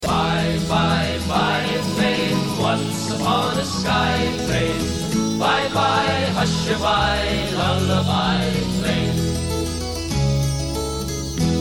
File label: biplane refrain